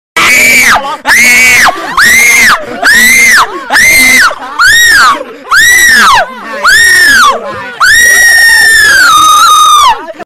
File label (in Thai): แจกเสียงกรี้ด; tieng la het